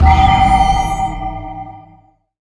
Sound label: Mental Shout; Gamigo